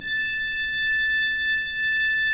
pipe organ, 3-D Audio

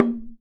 TASHA, ETH PERC; WorldSounds

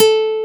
NYLON A 4; AKAI S-Series CD-ROM Sound Library VOL-1

